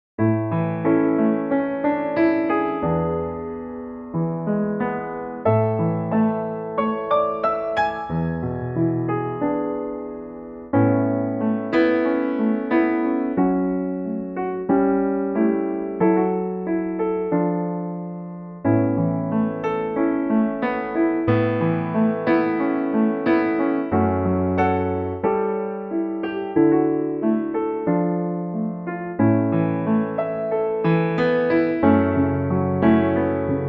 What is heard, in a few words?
piano A